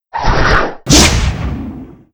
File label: Siren Typhoon; Seer; GamigoNA